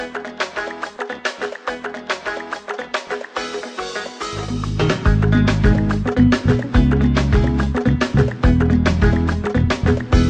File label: Amazigh